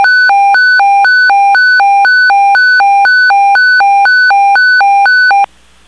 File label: tone evacuation